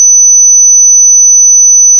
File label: phone